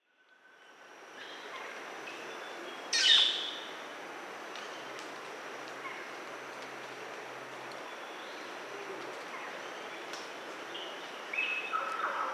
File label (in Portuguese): canto do estorninho metálico